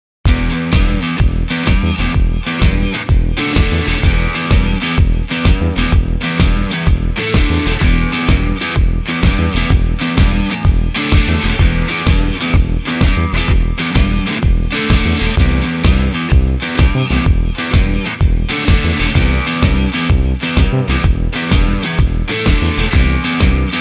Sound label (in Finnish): Perus Kitara; Kraku